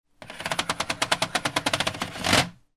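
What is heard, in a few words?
percussion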